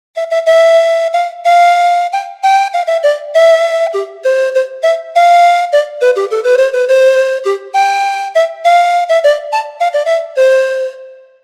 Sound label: Pan Flute; TURBO VL